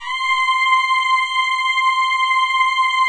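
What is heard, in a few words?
PAD, atmospheric pads; Best Service; XX Large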